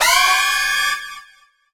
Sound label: Jingle Dungeon Boss Battle; Puzzle & Dragons Gold